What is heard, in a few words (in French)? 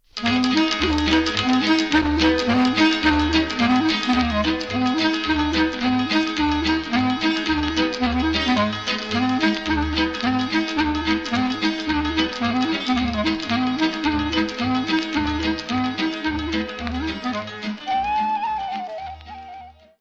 Hora - Roumanie